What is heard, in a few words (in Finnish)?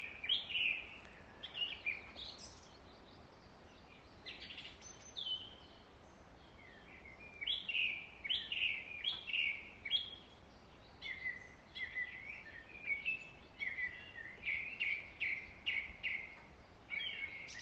linnunlaulua; Kesämusiikkia; Käpylän musiikkiopisto